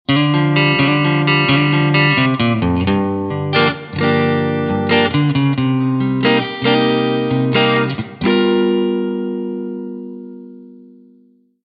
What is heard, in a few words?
Blues; elements